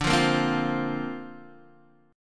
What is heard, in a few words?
D-Minor 7th Sharp 5th, D, Guitar, Open D